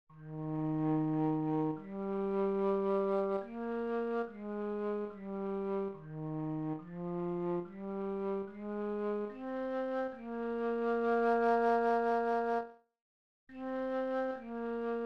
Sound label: Bass